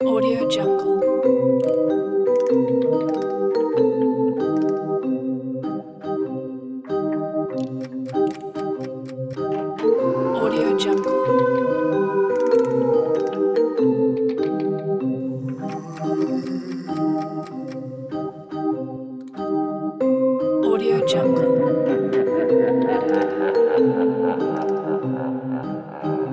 halloween haunted house loop

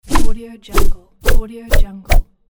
arrow whoosh

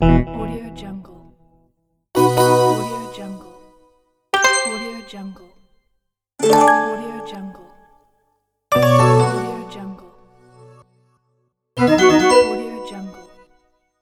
game sound fx

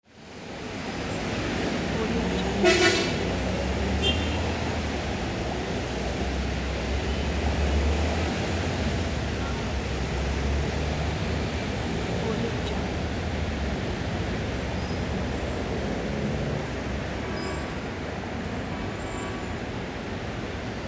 traffic

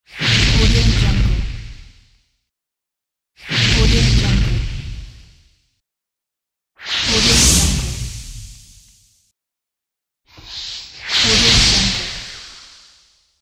flames of flame; almira23

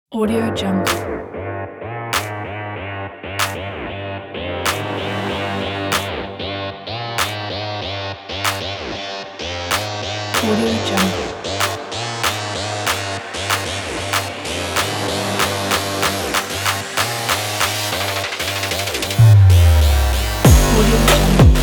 sport trap

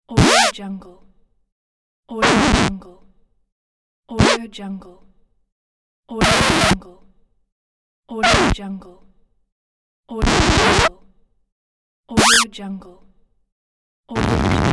record scratch; dauzkobza

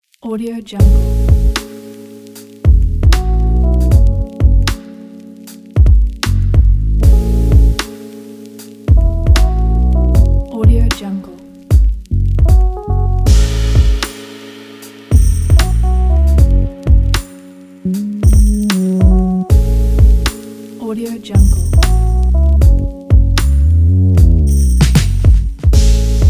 winter hiphop

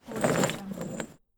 large rusty chain drag; stormwaveaudio

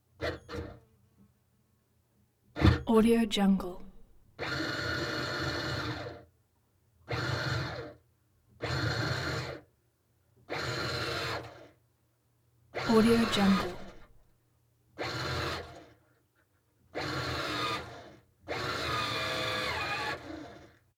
wheezing motor turn on constant switch off

